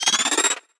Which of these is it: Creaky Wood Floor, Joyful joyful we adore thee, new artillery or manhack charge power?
manhack charge power